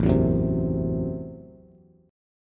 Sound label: A Minor 6th A Bass; Am6 strummed; JGuitar